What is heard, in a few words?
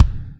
Kicks